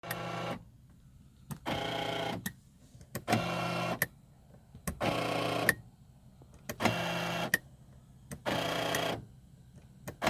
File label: loud noise when locking unlock doors accord